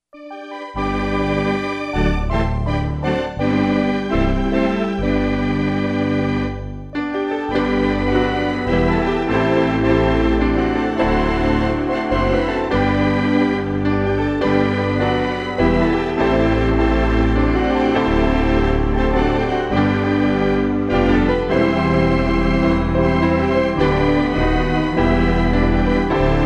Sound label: instrumental reference mix; I Will Sing Of My Redeemer